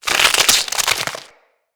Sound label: Infested Ancient Tank Limb Stretch; Emissary Ancient Tank